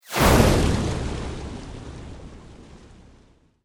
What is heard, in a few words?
Sweep Mechanical Medium